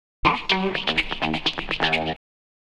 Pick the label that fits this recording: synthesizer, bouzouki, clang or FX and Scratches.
FX and Scratches